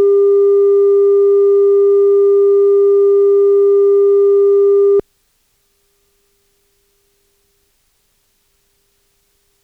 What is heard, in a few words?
315Hz 0dB; 120min